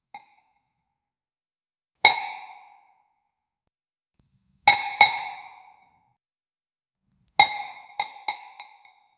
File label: cow boy whip sound effect; western